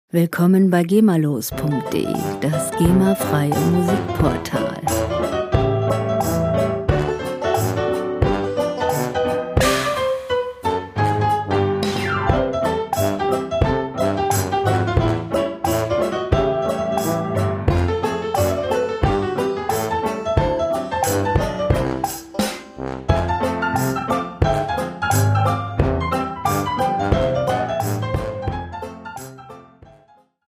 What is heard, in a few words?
longfinger rag